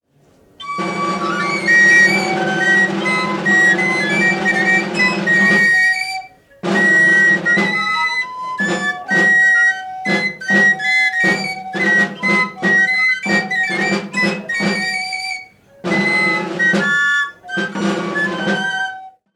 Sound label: Basque Aurresku